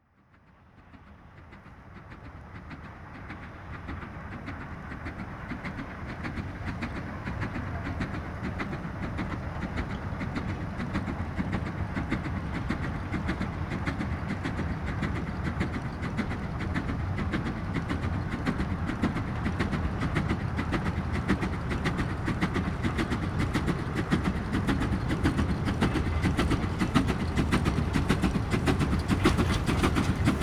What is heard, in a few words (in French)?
trains a vapeur a voie etroite; sonotheque